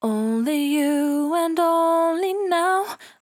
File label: 68bpm D, Velvet Vocal; Zero-G